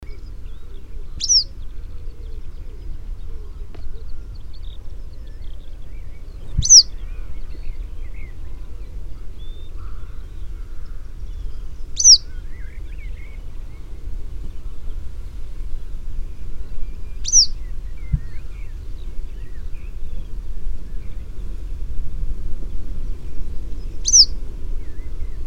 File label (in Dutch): Engelse Gele Kwikstaart